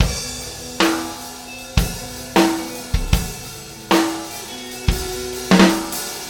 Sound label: loop